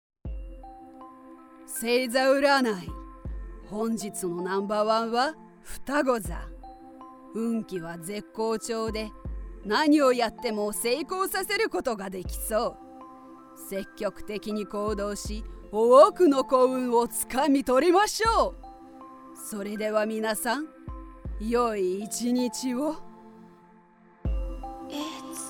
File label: Program Segment BGM